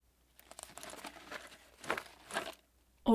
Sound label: colt45 saa cartridge box; prosoundfx